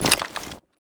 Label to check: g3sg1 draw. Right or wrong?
Right